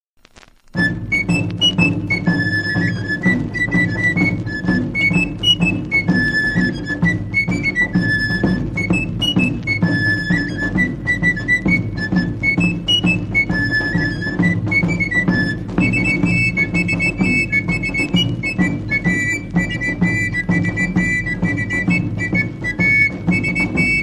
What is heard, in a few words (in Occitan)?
galobet e tamborin